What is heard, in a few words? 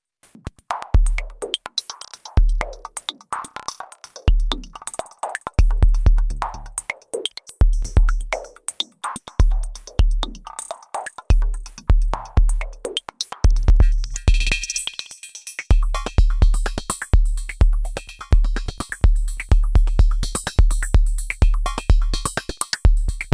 Nord G2 Glitchlab